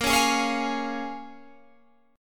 A sharp Minor 7th, A sharp, down, Guitar, Open G; A#m7; strummed

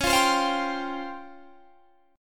Db Major 7th Flat 5th, Guitar Standard; 21,20,22,22,20,20; DbM7b5 strummed; JGuitar

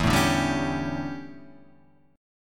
D Major 7th Suspended 2nd Suspended 4th

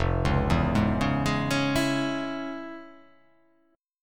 F Minor Major 7th Sharp 5th, chord

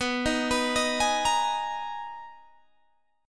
B Major 13th B Guitar Open G

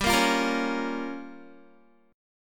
G Minor 7th Flat 5th, Guitar; strummed; 4E,4A,5D,5G,5C,6F; Gm7b5

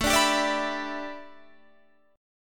A 9th Suspended 4th Guitar Standard, chord; A9sus4; strummed; capo=2

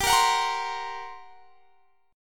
G Minor Major 7th Double Flat 5th G down; GmM7bb5; strummed

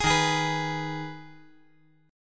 D sharp Suspended 4th Sharp 5th

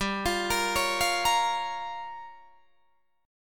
G Minor 7th Flat 5th, Guitar Drop D

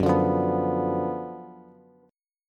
Gb Major 7th Suspended 4th Sharp 5th, Bass; GbM7sus4#5 strummed; JGuitar

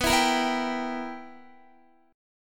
B Major 7th Suspended 4th Sharp 5th Guitar, chord; BM7sus4#5; strummed; x,14,16,15,16,15

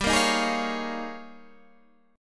D sharp 11th G

